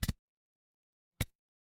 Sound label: ui menu